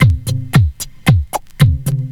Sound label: hardcell, Drum loops Electro